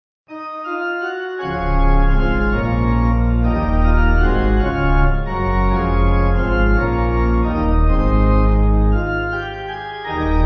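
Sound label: Pipe, pipe organ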